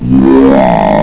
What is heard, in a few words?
zombie pain; svencoop; uboa; uboazombie